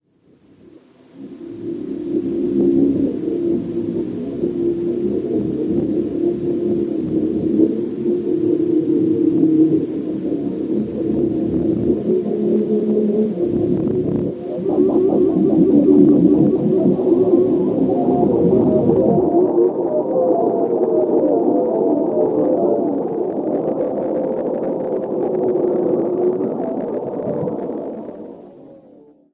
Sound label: jingle